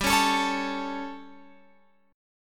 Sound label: G Suspended 2nd Flat 5th G Guitar Open F; Gsus2b5 strummed; JGuitar